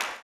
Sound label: CLAP, Blues Soul; Spectrasonics Vocal Planet